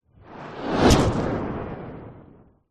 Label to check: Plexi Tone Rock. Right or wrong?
Wrong